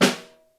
SN1 Room, Snare

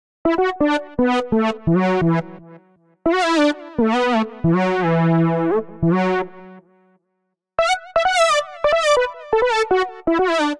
B Minor 86bpm; MIDI Clips; Melodic; Hyper Dee; Ableton; Factory Packs; Chop and Swing

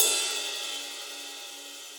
Ride Bell DeepSnareDynamics; Drum Hits; Standard Hits; Ableton; Factory Packs; Session Drums Club

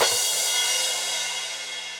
Crash 16 Low Loud Squashed; Drums; Drum Hits; Standard Hits; Cymbal; Ableton; Factory Packs; Session Drums Club

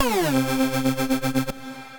Terminal Connect, Synth Lead; Ableton; Factory Packs; Build and Drop

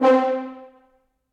French Horn Section Staccato; Ableton; Orchestral Brass

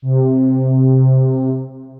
Super 80's Soundtrack, Synth Pad; Ableton; Factory Packs; Singularities

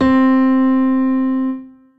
Grand Piano Equal Bright; Ableton; Factory Packs; Grand Piano Production

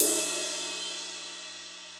Ride Edge Bright Room; Drums; Drum Hits; Standard Hits; Ableton; Session Drums Studio

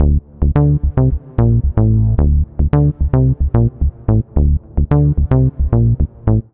Bass (Dm) 110bpm; Music Loops; Pre Dawn Bass; Ableton; Factory Packs; Singularities

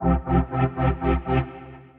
Pulse Warp, Synth Rhythmic

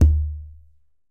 Tumba C Sub Mute, Conga, Latin Percussion; Drums; Drum Hits; Ableton; Factory Packs

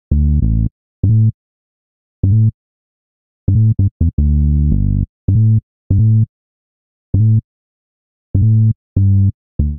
A Minor 85bpm, Bassline; MIDI Clips; Beat That; Ableton; Factory Packs; Chop and Swing

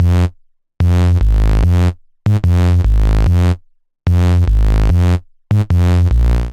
Kick A# Minor 147 bpm, Bassline; MIDI Clips; Tonal; Skitter and Step; Ableton Factory Packs

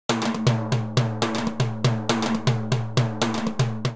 Timbales C; Latin Percussion; Instrument Kits; Ableton; Factory Packs

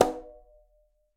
Conga B Slap Open; Latin Percussion; Drums; Drum Hits; Ableton; Factory Packs

